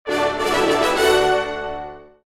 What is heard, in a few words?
notification big